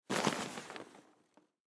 Hockey Bag Full, Hockey Bag Full Short Handling Noise; SPORTS; SoundEffects-Blastwave